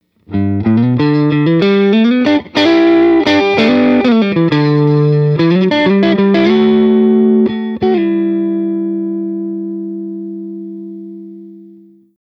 '59 Strat Middle

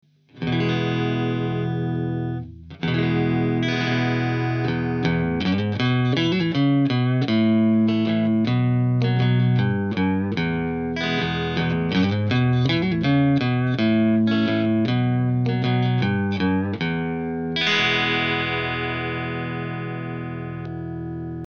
Neck Clean, pickups

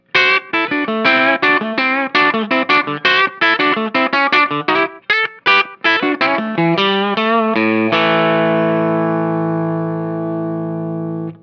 country lick bridge pu

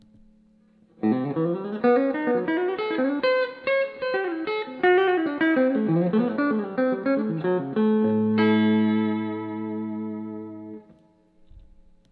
fat man tele bridge

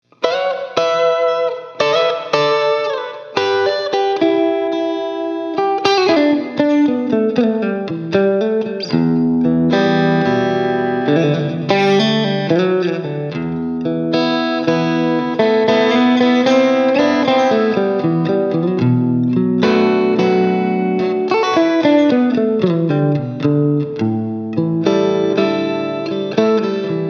Neck middle clean with breakup, pickups

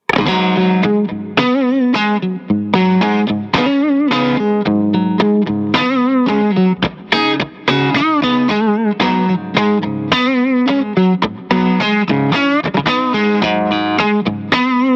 57 Neck

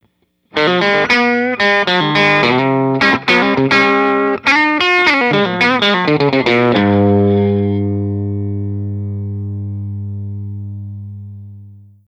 Broadcaster Bridge Lead

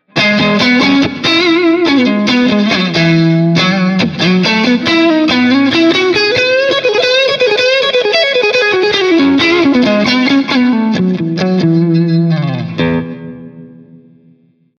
Neck clean breakup, pickups

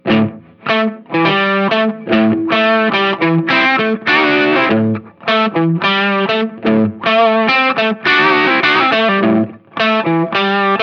dirty south bridge pu

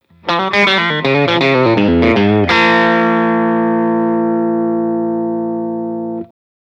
Tri-State Bridge Tap, pickups

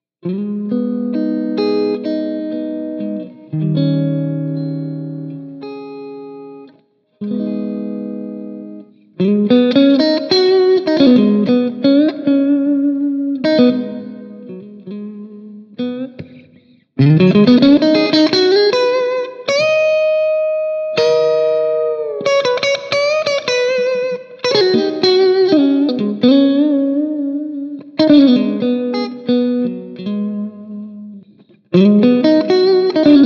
Neck Middle Clean

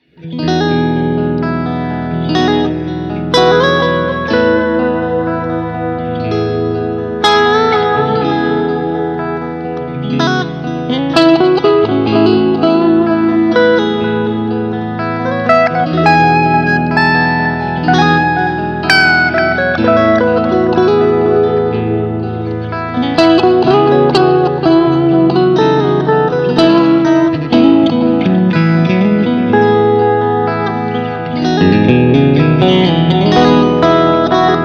63 4th Position, pickups; 1963 Epic Series Stratocaster Pickups

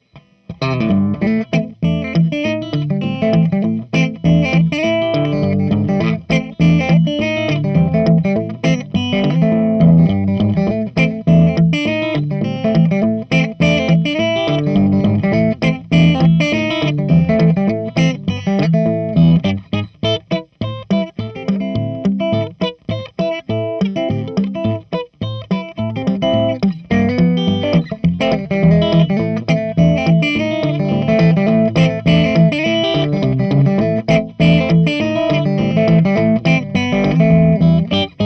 tele country slighty dirty

